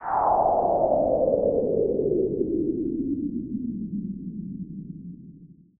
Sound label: sweep down